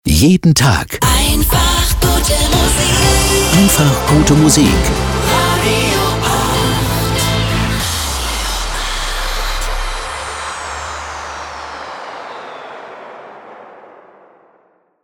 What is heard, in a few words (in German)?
RADIO Transition Fast Dance soft med; FastDance2000er; RADIO 8